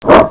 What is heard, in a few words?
boulder; George